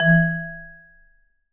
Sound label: V SHRT MF E2, Marimba & Vibes; Sampleheads; Dave Samuels